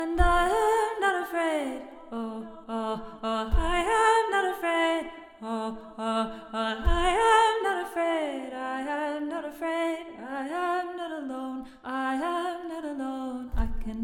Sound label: Alto